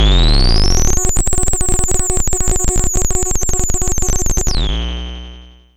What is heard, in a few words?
NOISE FX